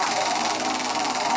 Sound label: chocolate wheel loop; koi princess